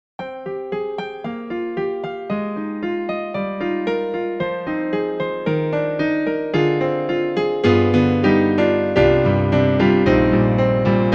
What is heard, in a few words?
Roland SRX-03 (XV-5050); Rehoisal